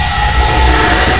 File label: blade fly, weapons; XDM